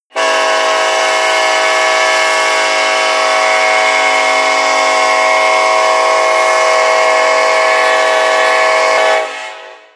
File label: k5lla, horn; run 8 v3 horn pack